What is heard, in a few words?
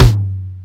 drum hit clap; osu!